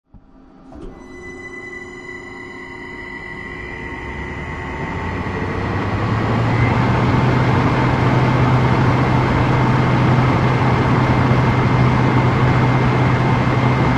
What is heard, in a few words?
AC VVVF IGBT